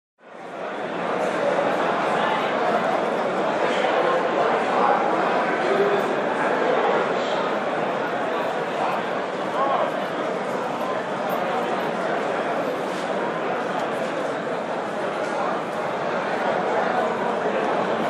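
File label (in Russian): крытый рынок общие звуки толпы людей торговля.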